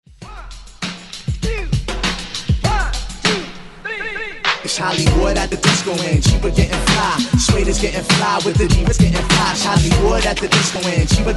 90s Re Drum 99 Bpm

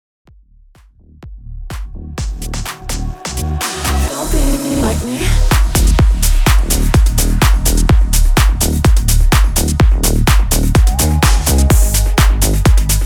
126 BPM

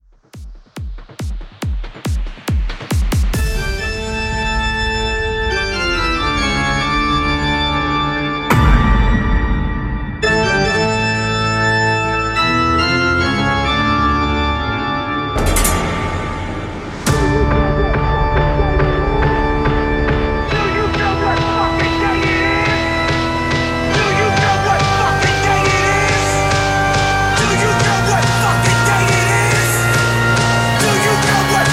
Psy Trance Halloween; Remixfreakz

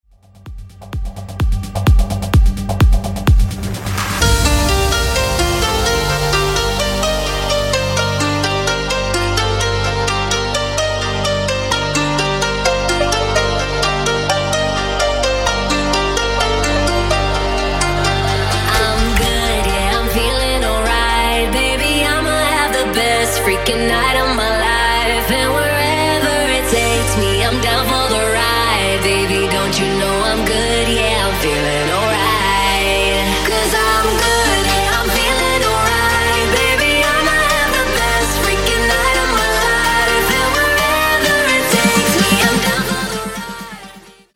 Top40 Future Rave

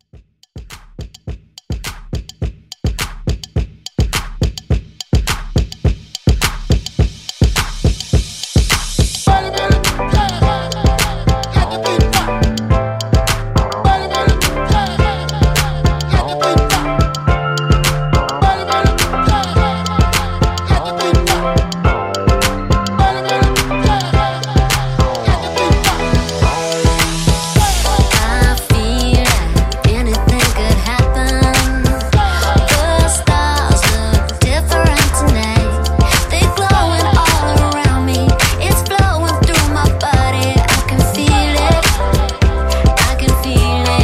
20s Top40 Hype Redrum 105 BPM

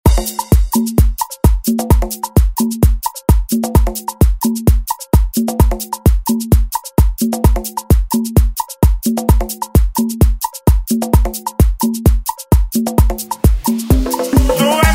Latin Pop Redrum snippets; Deejay Hit

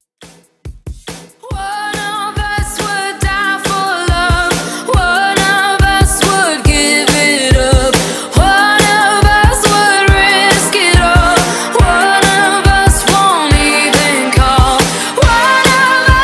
Uptempo, Top40s, 140 BPM

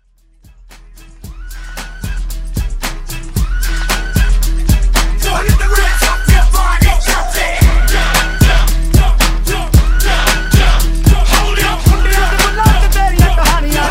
Mash Up Redrum

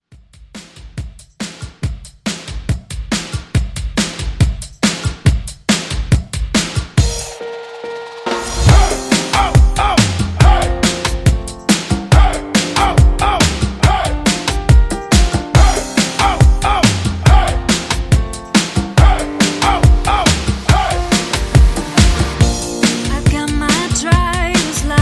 Redrum Hype; Remixfreakz

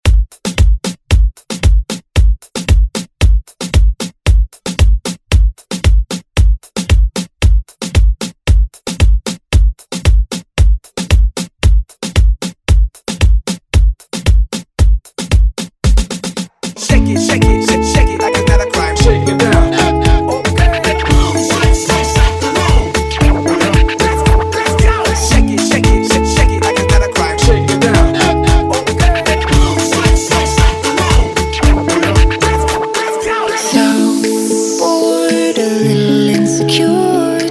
Moombah Hype Intro

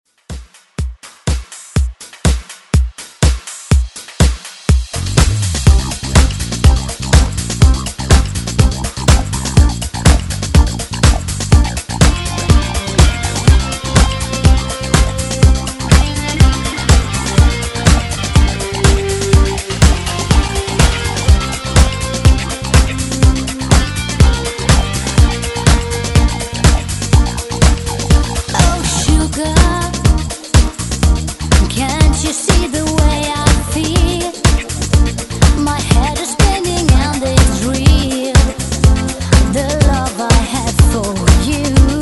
20s ReDrum 123Bpm; DJDOLLS

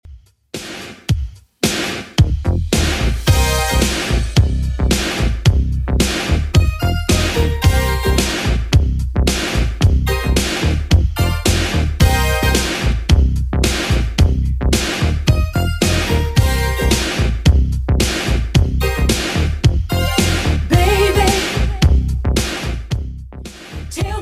80s Redrum 110bpm

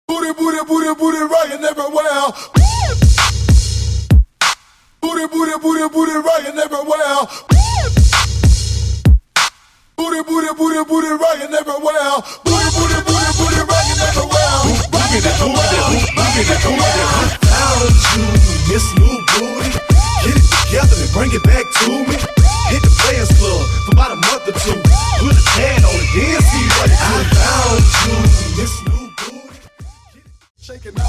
Scratch Drop Intro; remixplanet

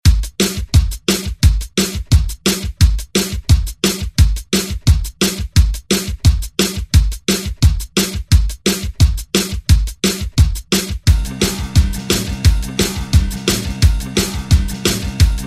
50s remix, 174

